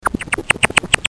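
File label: chipmunk sound effect in the forest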